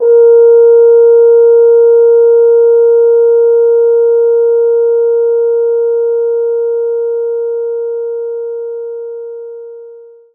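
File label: French Horn mf A#3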